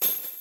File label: perc shaker